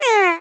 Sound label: monster sad; Cut the Rope